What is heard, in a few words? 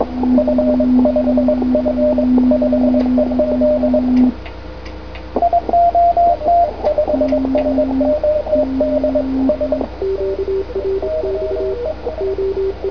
cw